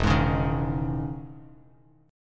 E Major 13th down Bass Standard; EM13 strummed; JGuitar

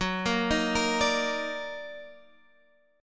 D sharp Minor Major 7th F sharp, Guitar

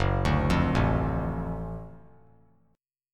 Gb Minor Major 7th Sharp 5th Gb down; GbmM7#5 arpeggiated; JGuitar

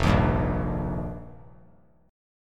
F 9th Suspended 4th F, chord; strummed; JGuitar

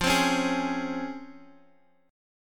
Db Major 7th

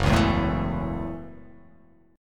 F sharp Minor 7th F sharp; strummed; JGuitar

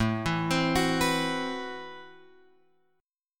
A Minor 6th Add 9th A, Chord; Am6add9 Chord {5 7 X 5 7 7} arpeggiated

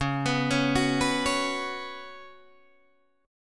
Db 7th Suspended 4th Db down Guitar DADGAD

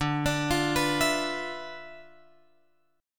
D Minor 6th Add 9th D

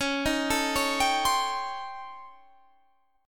A 7th Sharp 9th Flat 5th

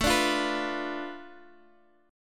F 6th Flat 5th A, chord

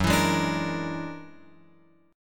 F sharp Augmented 9th, Guitar, Open F; strummed; x,1,4,3,5,5; JGuitar

